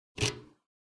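vstavili kluchi v zamok zajyganiya mopeda, keys inserted into the moped ignition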